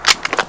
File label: awp clip out; cstrike; DEX